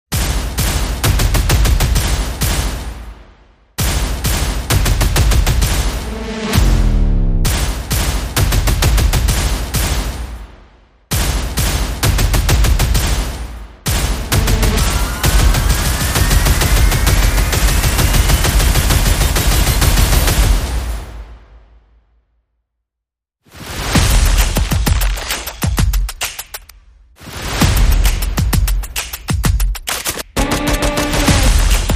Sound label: Percussion and Effects